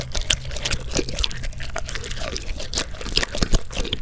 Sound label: Unimaginably Uncomfortable Gooshy Sounds, melon; Micro Pack